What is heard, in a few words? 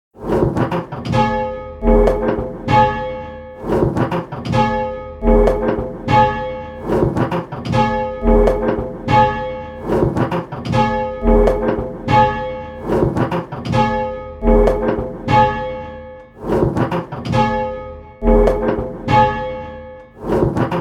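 bells, 8 Bell Games; Just One Bell; Pebworth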